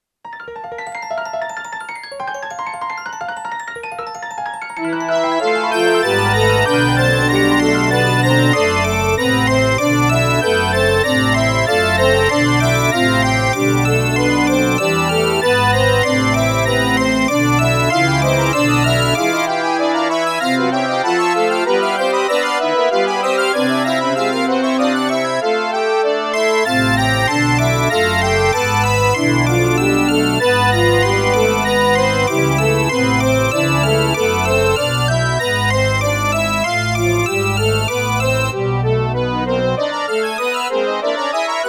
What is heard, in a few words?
fugue